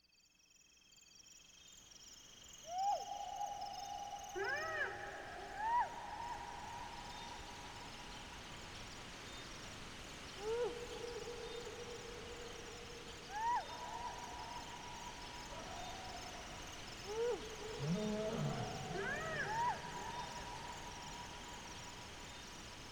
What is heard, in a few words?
Jungle World ambience; Lode Runner The Legend Returns